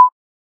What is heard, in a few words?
Click; Diamond Diaries Saga; Android, iOS